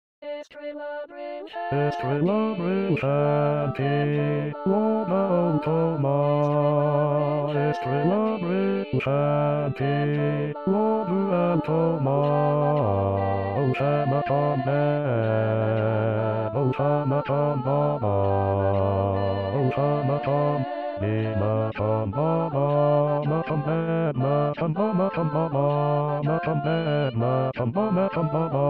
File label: bass